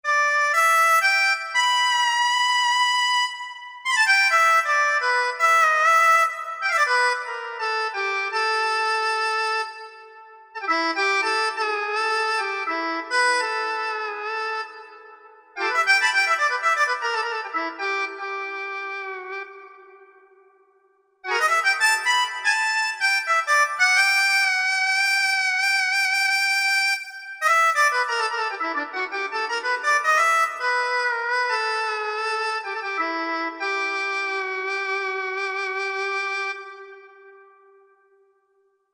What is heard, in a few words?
Bluesharp